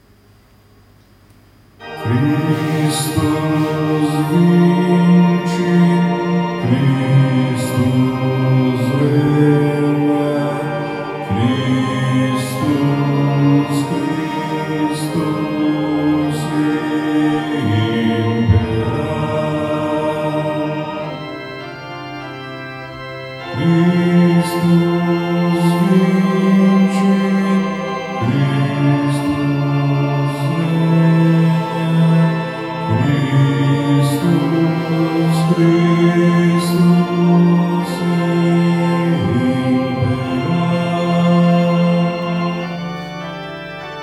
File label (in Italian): Christus vincit Contralti